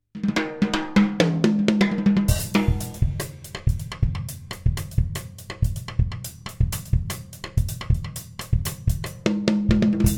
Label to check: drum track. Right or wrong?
Right